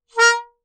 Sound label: Melodica Short Note